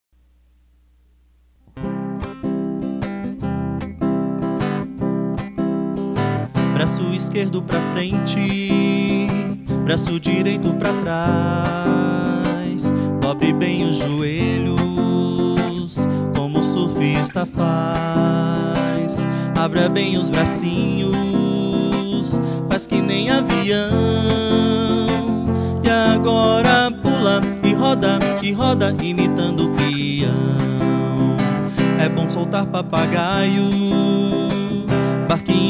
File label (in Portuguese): cantando e brincando